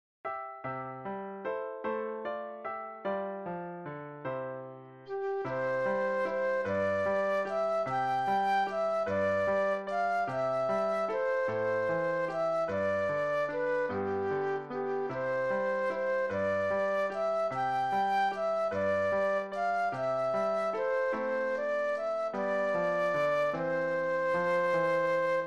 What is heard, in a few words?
Flute Solo; christmas